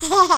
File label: baby laugh short